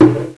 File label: STEP; Martial Arts Demo